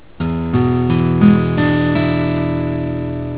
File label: chd e7, guitar